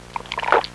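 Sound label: swallowing; People; Sound Library 2000; Wayzata Technology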